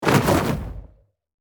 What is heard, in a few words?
Dragon wings flapping movement single